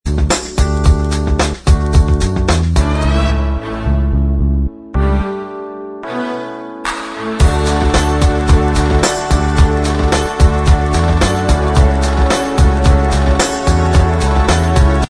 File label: Inst